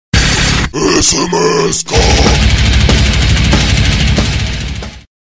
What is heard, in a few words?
Rock